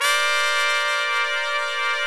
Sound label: TRUMPETS; Greytsounds VOL-1